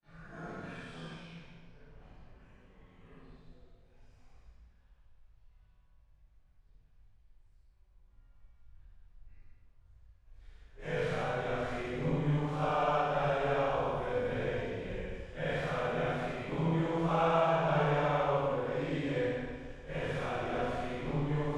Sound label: Choir